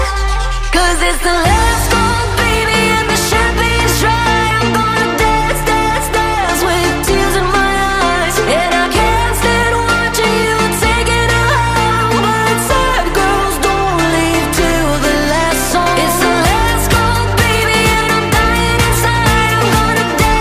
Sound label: Sad Girls Ringtone